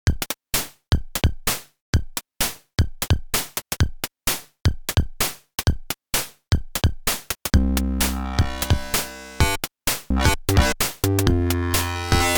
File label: fm step opl3; weeklybeats 2026